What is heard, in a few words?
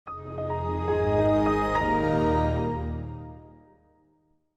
windows xp startup 8bit; 8bitgamer